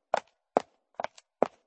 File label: walk